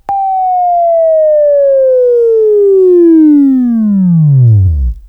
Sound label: Buzz, Right; Labview-Program; Sweeping Training program